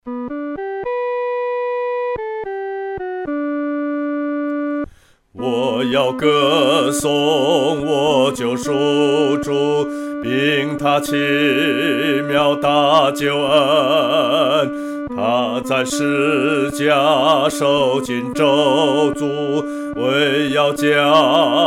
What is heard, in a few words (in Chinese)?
独唱 第二声